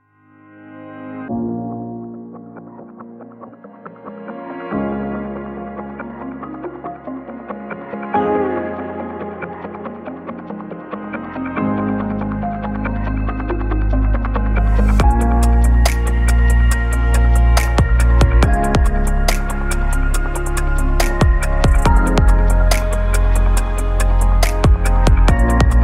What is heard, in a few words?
Ambient Beat